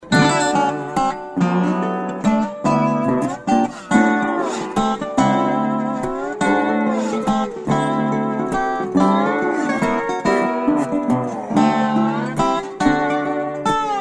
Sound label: chipmunks